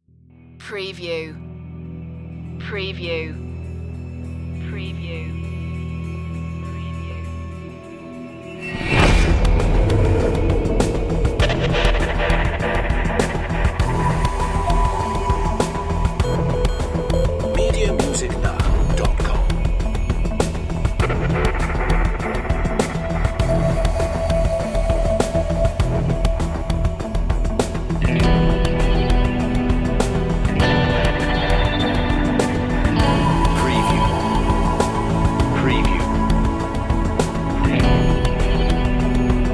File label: underworld series dramatic cue